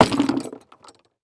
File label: Sports Bag Golf Bag With Clubs Hitting Cement